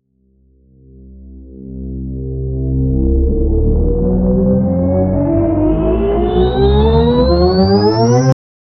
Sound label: synthwave